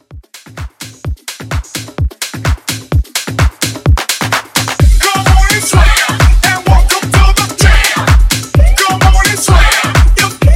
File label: House, 128